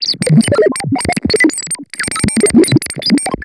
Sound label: robot chatter; Earth and Beyond; EA Games